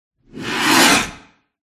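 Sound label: wyvern attack voice up; viverna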